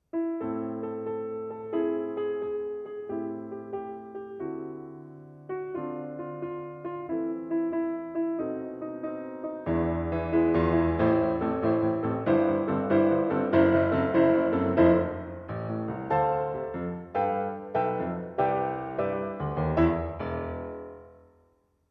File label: piano